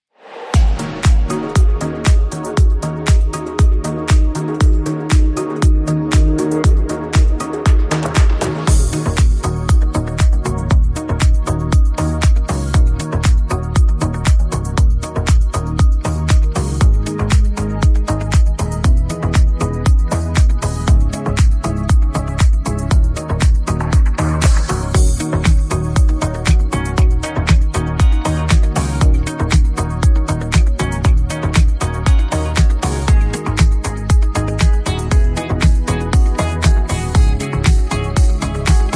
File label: Electro Version